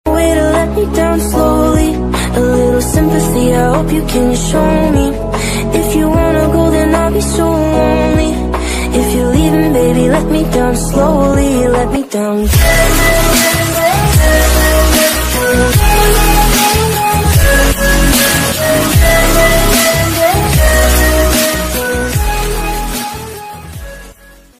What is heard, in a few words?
sweet music english ringtone